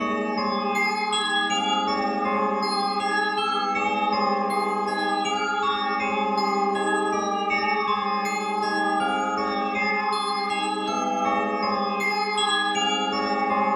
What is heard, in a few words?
The Minds Decay no drums; anonymous agony windows gamerip 2019